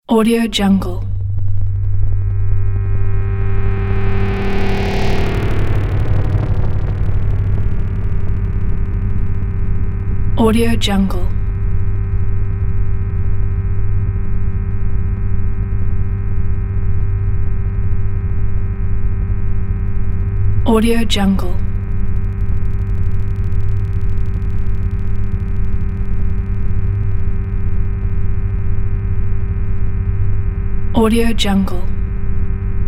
drone

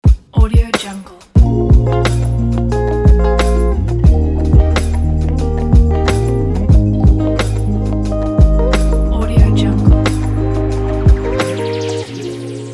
hip hop logo; red ninja

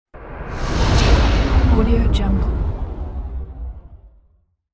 shutdown

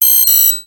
hitech double ring beep; sound ideas

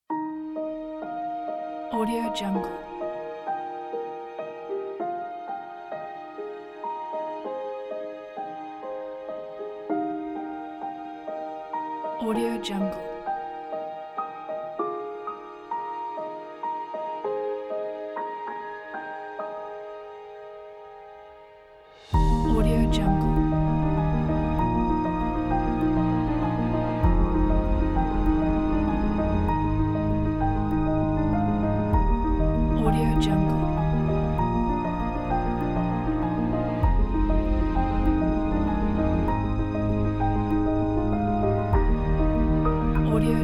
romantic uplifting

